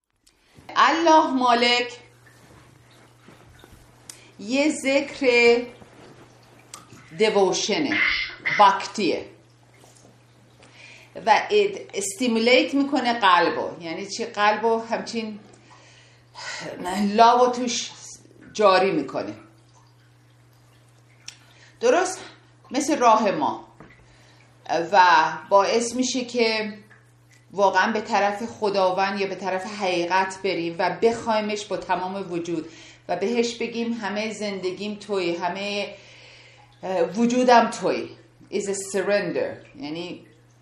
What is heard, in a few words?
devotional; Devotional prog; Zekr